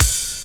ride, cymbal; Ueberschall; Techno Trance Essentials; DRUMS

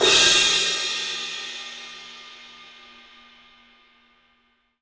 CYMBALS; 3D Sound; 3D Drums

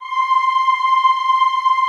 Classical Choir, C5; Best Service; Extended Classical Choir

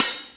HH, HIHAT HIP, Dance Mega Drums

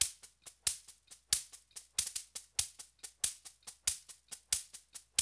World Dance; OZ!

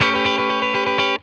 A JANGLE, Funk Guitar; Zero G - Funk Guitar

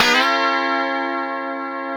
B 3 FUNK CHORDS, Guitars; Spectrasonics; Hans Zimmer Guitars VOL-1

